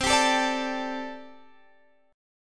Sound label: C 6th Suspended 2nd C